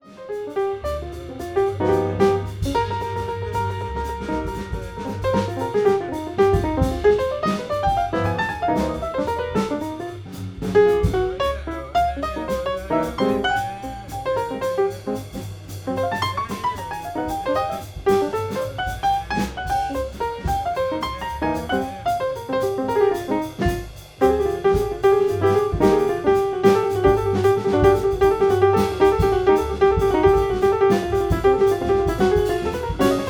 piano unprocessed

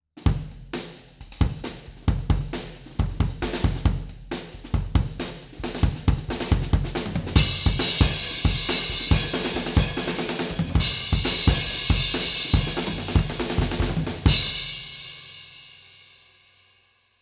kick distanced

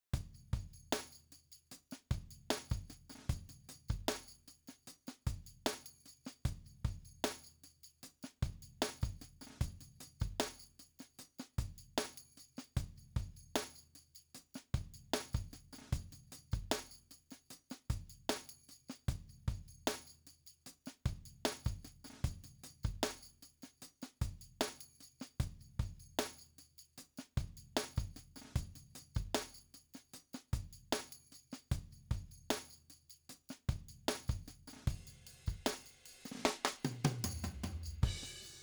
original drums

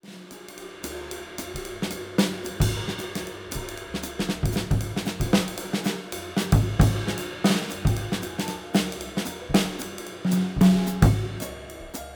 drums processed

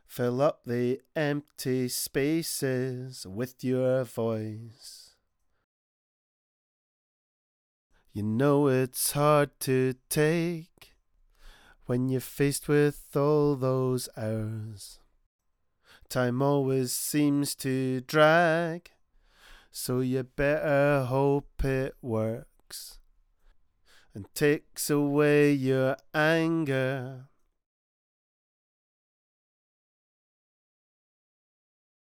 lead vox raw

